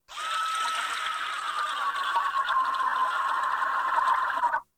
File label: Sea monster screech